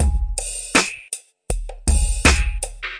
bella bonga beat, drum loops and breaks, 080 bpm; 000 to 080 bpm

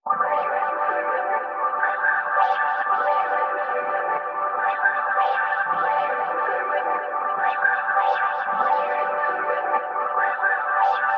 lush ambience, Pads

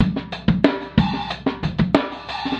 funkisharp compr, drum loops and breaks, 92 bpm; 091 to 100 bpm

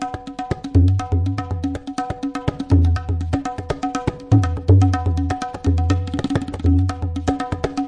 122 bpm tabla; 121 to 130 bpm

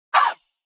Chant; Khezie Hip Hop Kit

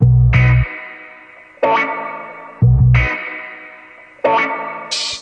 92 bpm raga guitar bass; 091 to 100 bpm

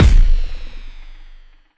blimey kick; Kicks; single hits

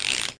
stereo ratchet; Weird and Interesting Hits; single hits

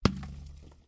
snowball glass; Throwing Snowballs Kit

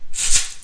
rainstick shaker; ETHNIC and WORLD PERCUSSION

